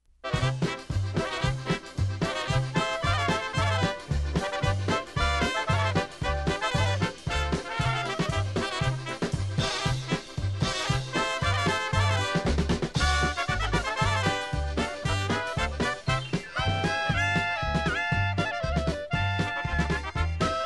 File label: Polka